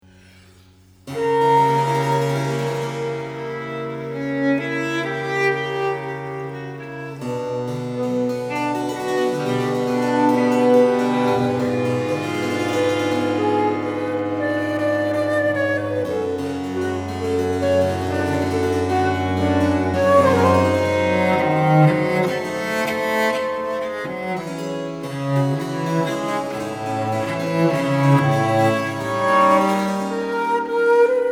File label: Trio Sonata in G Minor for flute violin and basso continuo Adagio; TWV 42:g13